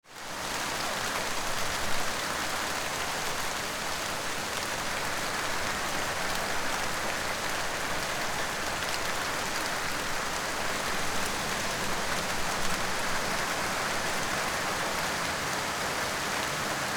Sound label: Tropical Rain